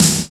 Fireworks; Koushien 3

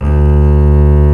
BASS D1, Solo Double Bass

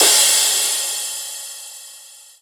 Crash, Cymbals

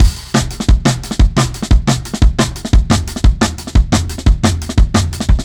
Rough Beat 4 Bar, Drum n Bass; KJ Sawka; Drum n Bass & Beyond

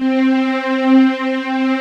PAD STRINGS; Pro-Rec - Synthsonic

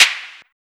claps, techno; Best Service ProSamples vol.45; Techno ID; single-sounds; single-drums

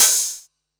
Open HH, Cymbals

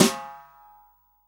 SWING SNARE CHROMATIC, Real Drum Kit; REAL DRUMS

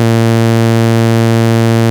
2600 A#3, ARP 2600 Synthesizer; Hollywood Edge - Giorgio Moroder Rare Synthesizer Collection

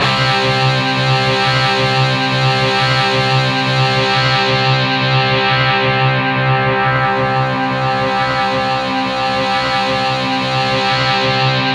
C3, ANALOG SEQ; Roland JV 2080

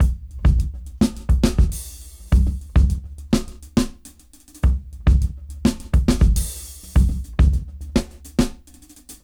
Jazz Dry 104 BPM; AMG - Keith LeBlanc Phat Butt Jams

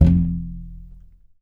TUB BASS F 1

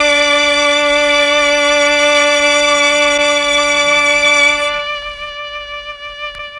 MEL STR, Legendary M400

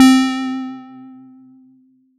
LED C4, Yamaha TX7 synth keys

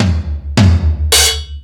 Sluggo Drums, Drum Machines

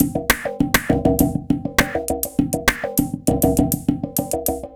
Technical Tabla Beat; Sonic Foundry (Sony Creative Software); Myths of Technology; Composite Beats

